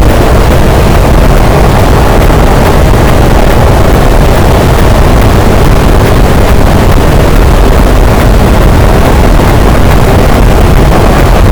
cachalot, boat; Physeter Macrocephalus; SNR 6dB

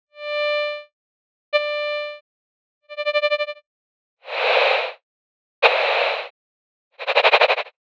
mod signal

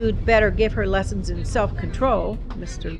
noisy

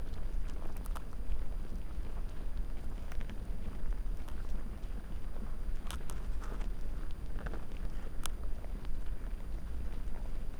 VOCALISES, Guyane

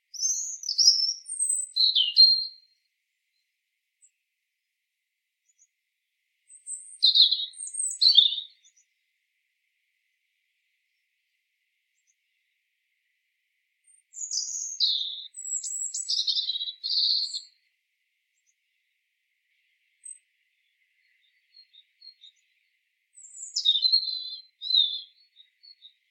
Erithacus rubecula; SETE

